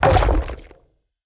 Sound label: ambient game wet item collect; esmteam